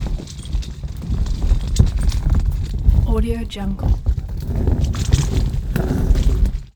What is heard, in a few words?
robotic lifeforms textures source plastic vacuum pipe; robotic lifeforms 2; shuffling